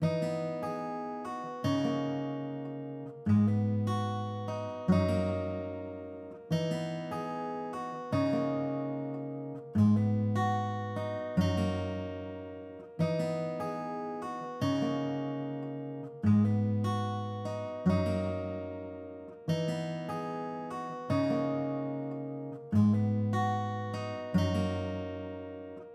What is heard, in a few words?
Guitar, 148 BPM G# Min; Stems; Cymatics; Good Vibes